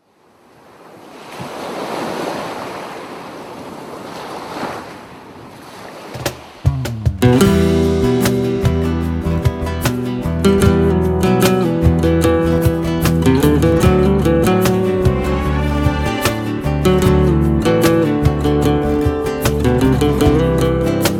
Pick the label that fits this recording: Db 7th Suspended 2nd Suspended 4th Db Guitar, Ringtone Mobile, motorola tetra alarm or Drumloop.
Ringtone Mobile